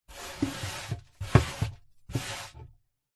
foley mud dirt dusting on wooden box scrape off by hand internal pov coffin